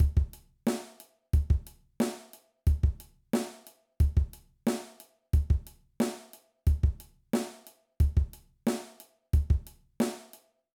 Rock Drum Beat

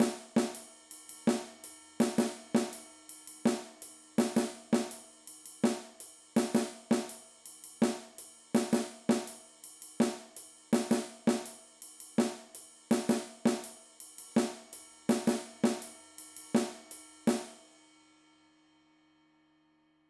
Busier Snare Drum Comping

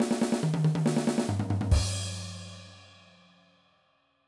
Punk Fill, drum

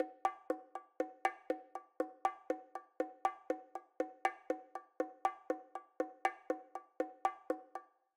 Bongo Pattern

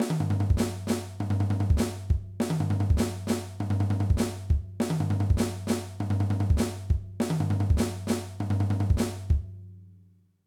Flam Drum Fill

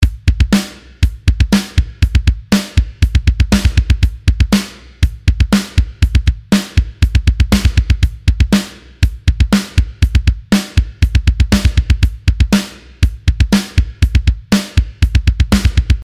Double Bass Beat, drum

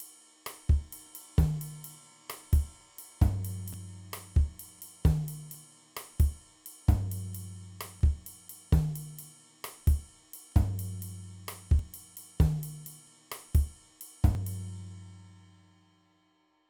Salsa Beat

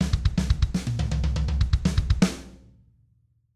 Triplet Fill, drum

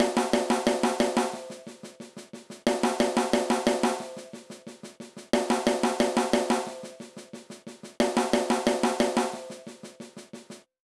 Dynamics Ex

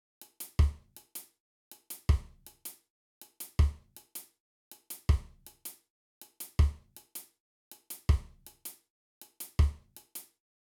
Reggae Beat, drum

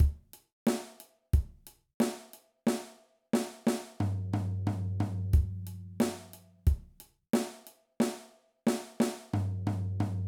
Basic Fill

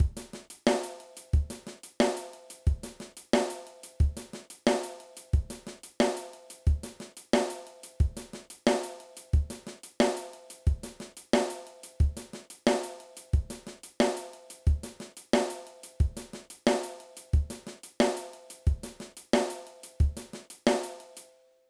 Intermediate Drum Beats

